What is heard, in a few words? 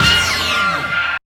RETRO HIT; Spectrasonic Distorted Reality 2